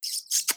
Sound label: quick kiss